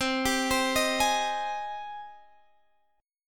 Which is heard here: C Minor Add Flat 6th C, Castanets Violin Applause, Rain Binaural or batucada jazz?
C Minor Add Flat 6th C